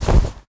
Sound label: movement armor; sobek; smite